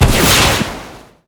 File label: rocket launch short